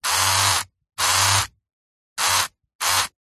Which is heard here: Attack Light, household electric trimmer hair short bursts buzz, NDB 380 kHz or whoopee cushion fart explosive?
household electric trimmer hair short bursts buzz